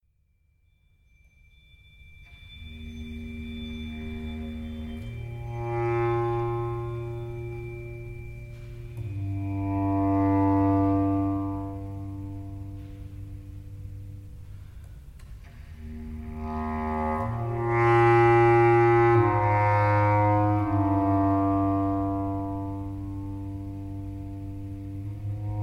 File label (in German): Baßklarinette